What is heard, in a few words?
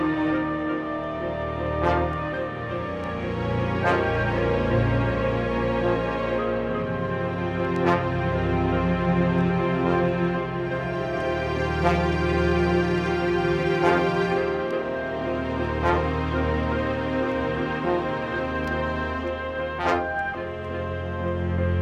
acoustic version